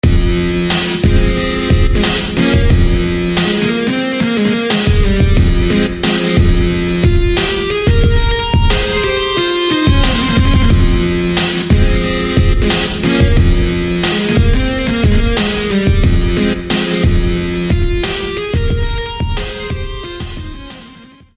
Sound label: Battle Axe; SFB FX